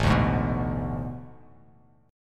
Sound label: A Major 7th Suspended 2nd Suspended 4th; AM7sus2sus4 strummed; JGuitar